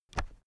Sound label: keyboard external space push